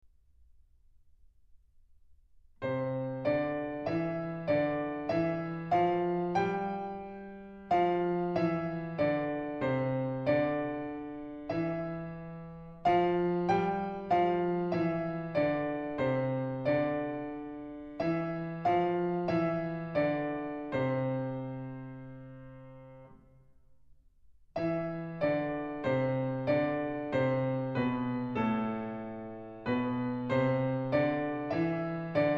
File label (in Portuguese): Exercicio de piano; Bella Bartok